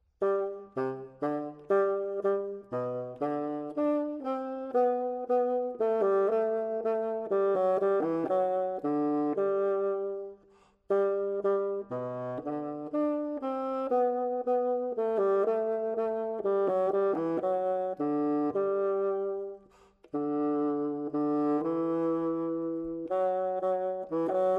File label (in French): Basson